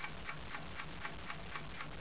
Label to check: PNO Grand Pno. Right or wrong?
Wrong